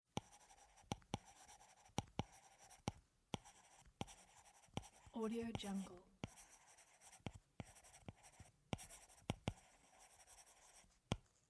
writing on a tablet

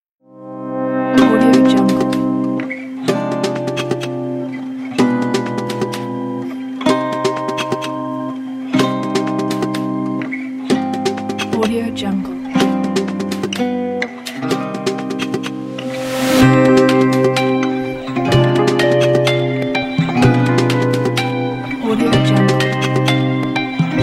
pop latino; dixidd

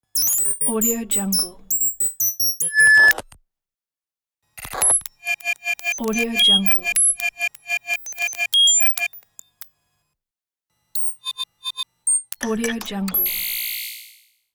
micro system signal

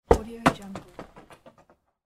strike the ball

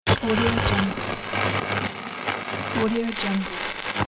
electric schock and electrocution; sound-dispenser

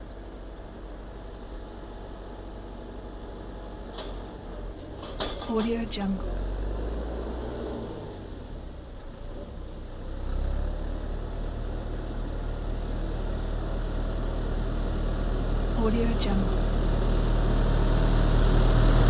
tractor slow pass by

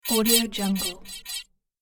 droid chirpy bleeps

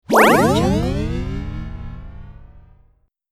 slow motion; sonic-boom

